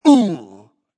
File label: Vocal Deep Grunt